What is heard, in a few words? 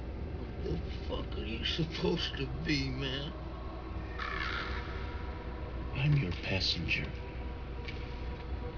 crow passenger